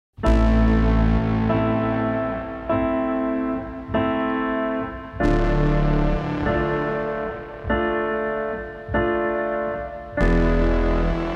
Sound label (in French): ambiance; dossier rosa pink exposition creation sonore